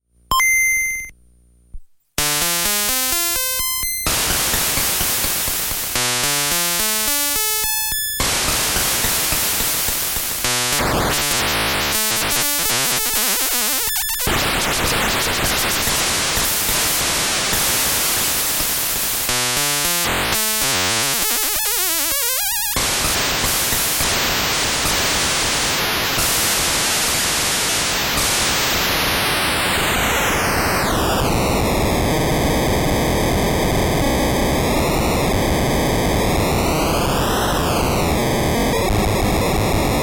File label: noise only circuit bent gameboy noise; weeklybeats-2018